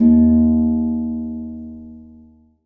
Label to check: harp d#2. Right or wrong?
Right